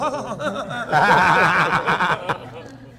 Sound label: epic laugh